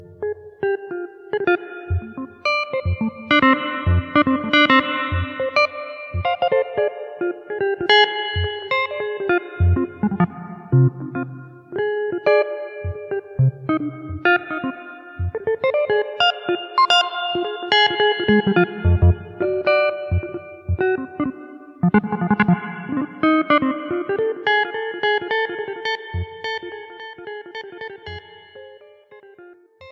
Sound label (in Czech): Kytara na jazzove procházce